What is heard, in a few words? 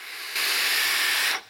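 sound of inhaling through lips like a straw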